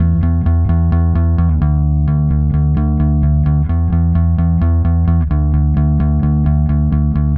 Bass, Indie Rock

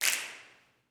SNAPS; Vocal Planet Vol1 Gospel; CLAPS+SNAP

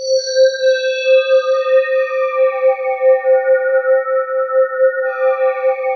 BELL STEE, ATMOSPHERES; Sound Gallery - Charged

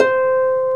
HARP C5; Roland - LA Composer vol. 1; WOODWINDS

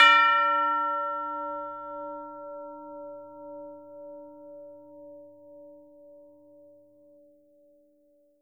tubular bells hit A#4; AO bell

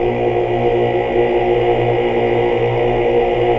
OOH OCT

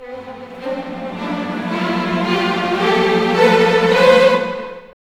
OPEN; East West; Scoring Tools; ORC; Open Theme

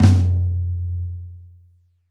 Rock Flam Tom, Roland BK-5

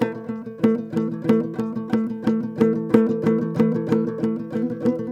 TOPSHUR; Soundscan vol.60; Asian Traditions; AKAI

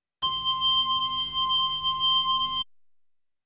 B3 Organ; Masterbits CLIMAX 8

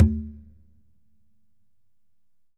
Congas wet, drums percussion

